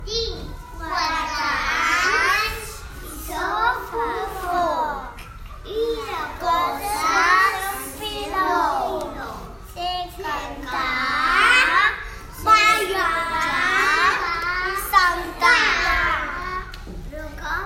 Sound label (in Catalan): Timbalers